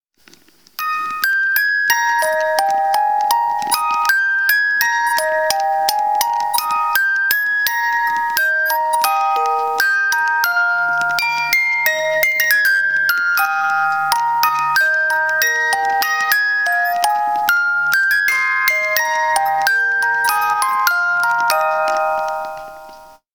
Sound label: the best children's music box you've ever heard oh when the saints go marching in